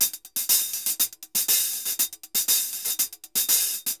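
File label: hi-hat, DRUMS SAMBA; Best Service ProSamples vol.14; AKAI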